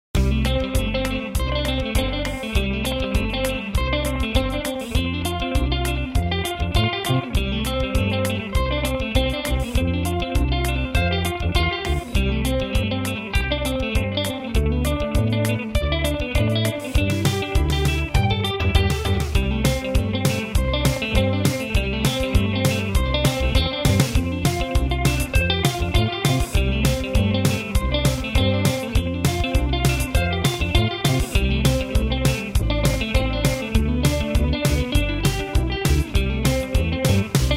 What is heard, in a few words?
Time machine, Efectos Biyang